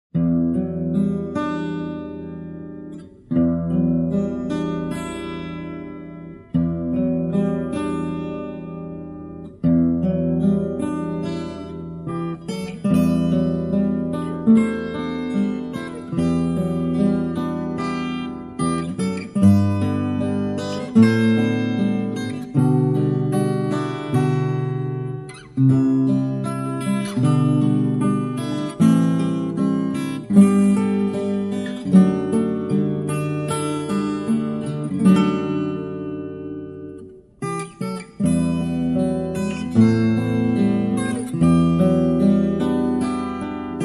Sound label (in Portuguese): INSTRUMENTAL